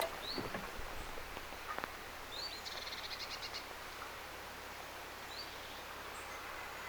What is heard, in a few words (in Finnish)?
vit-tiltaltti